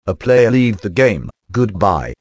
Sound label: player leave